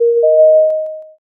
m notify

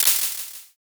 clap

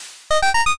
line clear

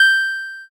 ding

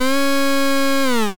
air horn

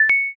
ic touch

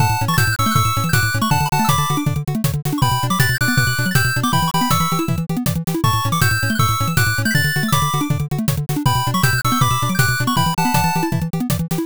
bgm drive

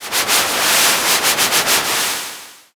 river

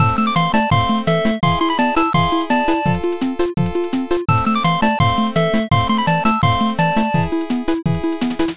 bgm

